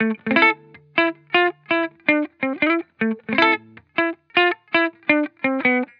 Hip Hop Guitar Loop - 160 BPM A Maj; Cymatics; Vibrations Guitar Sample Pack

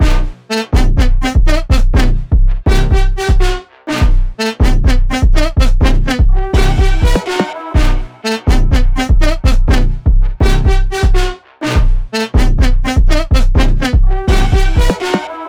124 BPM E Min, Melody Loops; Anubis; Cymatics

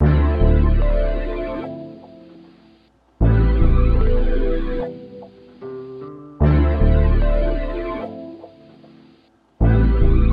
150 BPM A Min, Vintage & RnB Melodies; Mixed Feelings; Cymatics

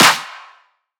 Riddim Snare Clap; Snares & Claps; One-Shots; Ghosthack; Riddim Essentials Volume 2

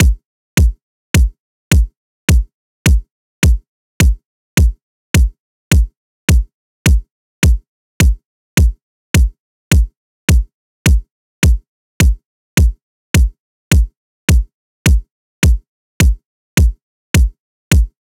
Drum Loop, 105 BPM Kick, Latin; Loop Stems; Crisp

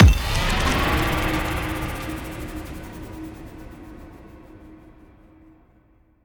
Impact, Dubstep, FX; Impacts; Cymatics; Dubstep Starter Pack

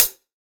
Terror Closed Hi Hat, Dubstep; Drums; One Shots; Cymbals

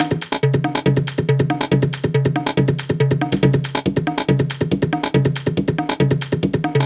Perc Loop 140; Drum; Saga; Ghosthack; Drum Mega Bundle Demo; Drum Hero 5

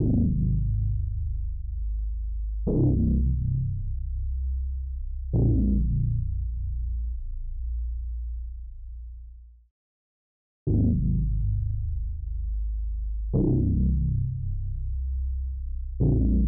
90 BPM C Min Bass; Melody; RnB; Loop Stems; Cymatics; 2022 Melody Collection - RnB Pt 1; Deep Love

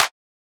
Clap; Vibes; Cymatics; Drum One Shots

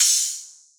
crash, cymbals, drum one shots

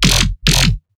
Riddim Bass Hit F Growl; One Shots; Ghosthack; Riddim Essentials 2020

